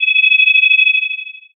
forcefield

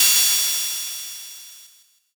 cymbal

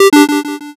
error

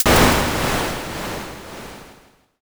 thunder

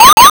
warning

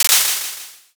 clap

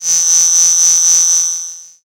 cicada

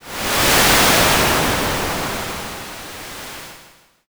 wind

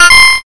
correct

